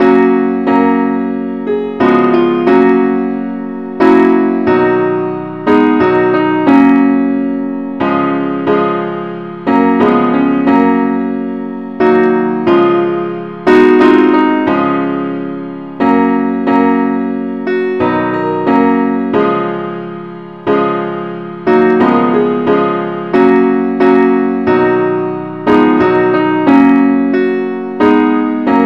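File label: simple accompaniment key G flat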